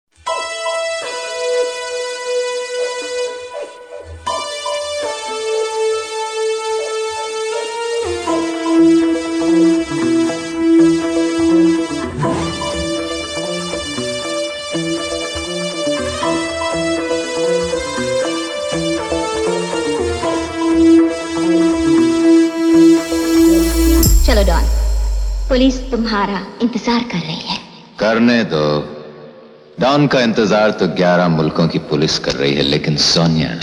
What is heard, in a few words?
Trance, Competition Beats